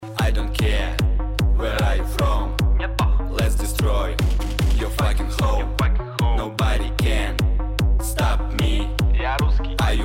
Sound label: start action; music kits custom